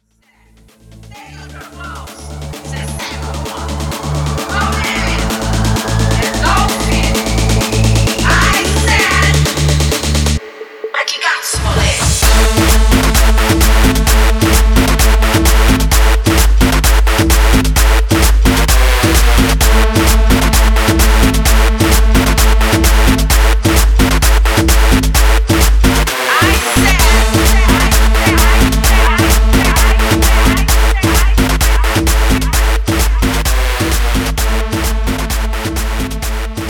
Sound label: tribal remix 130 bpm